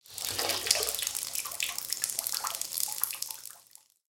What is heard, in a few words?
hydrant end; tanks; War Thunder